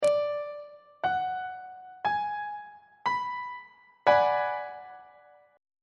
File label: a flat half diminished 7th 2nd inversion on treble clef